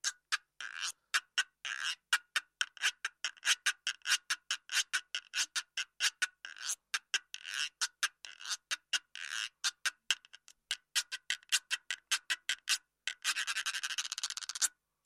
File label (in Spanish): Guiro Shaker